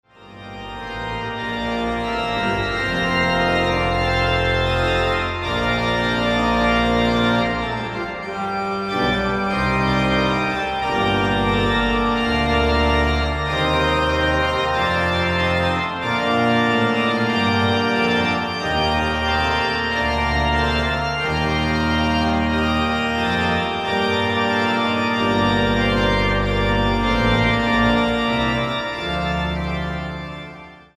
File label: orgue; Matthias Weckmann; Magnificat secundi toni; Primus versus